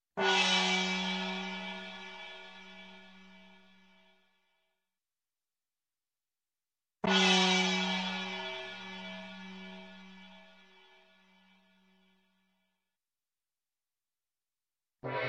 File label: Gong small Single Hit, Music, Percussion; Sound Ideas 2000 Series